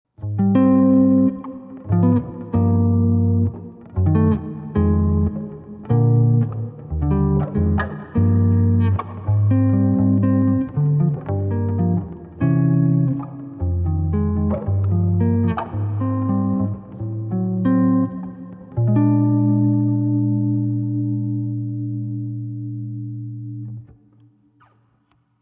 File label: Quad Cortex bass chords